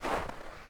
snow soft footstep; Minetest; VoxeLibre

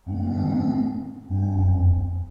blaze breath; mobs; mobs mc

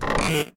default chest open; mcl chests

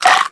hunger bite; mcl hunger

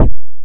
block, shields; Minetest; VoxeLibre

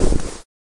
sounds cloth; Minetest; VoxeLibre

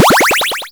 shoot; Flopsy; monsters

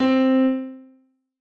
noteblock c; VoxeLibre; REDSTONE; mesecons

